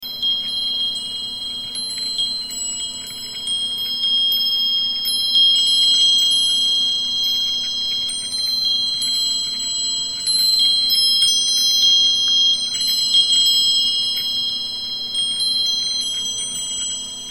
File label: Chimes